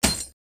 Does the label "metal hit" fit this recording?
Yes